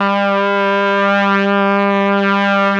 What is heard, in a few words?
BRASS; ILIO; Propeller Island Legendary M400